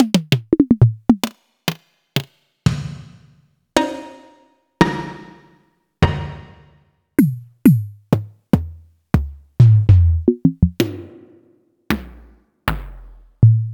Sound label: Elektron MachineDrum SPS1 MKII